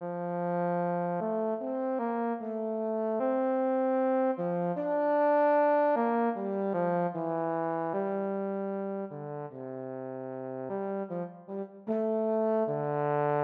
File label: Euphonium